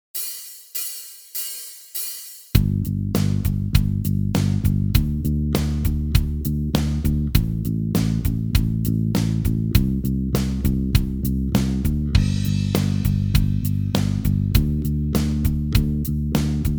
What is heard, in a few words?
Strumming, guitar; total guitar; play guitar now